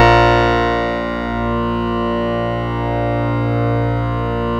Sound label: A#, PIANO; Big Fish Audio; Synclav FM